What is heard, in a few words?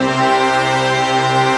PAD